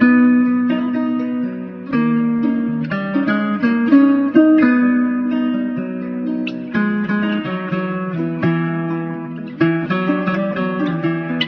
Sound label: guitar, loops